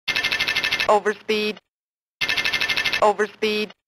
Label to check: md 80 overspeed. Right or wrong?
Right